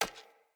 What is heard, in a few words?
Hunter Bow Grab, Weapons; Player; Bows; EnvoyHunterBowRangedMelee